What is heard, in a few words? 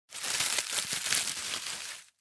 dried leaves crunch